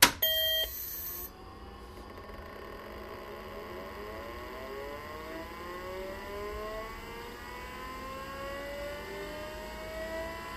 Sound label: Ibm Xt On Loop Turn On, fans